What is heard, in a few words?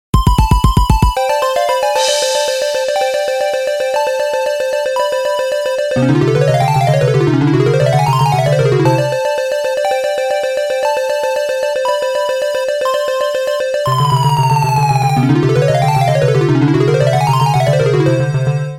BGM Boss